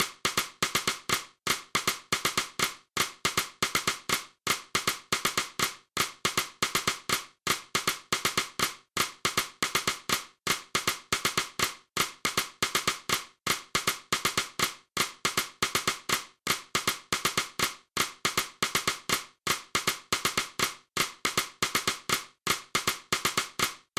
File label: columbia matanzas guagua